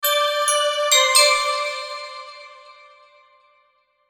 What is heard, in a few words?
Logo Bells Pad Melody Reverb; Sonic Logo; Blastwave; Sonic Logos; Production Element Imaging Element Accent Transition